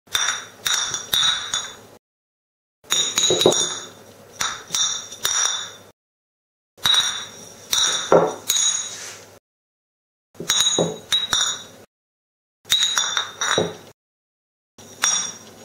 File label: Empty Beer Bottles